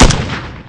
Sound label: Rifle; MilkChoco